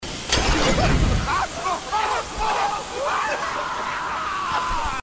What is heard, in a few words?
enemies burning; rise of the tomb raider